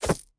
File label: object grab moneybag